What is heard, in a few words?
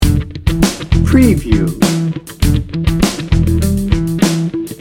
funk factory loop